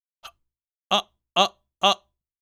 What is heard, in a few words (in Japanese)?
息を止めてからアッアッア